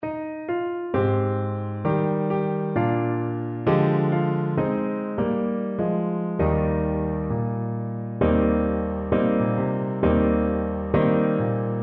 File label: this little light, Trumpet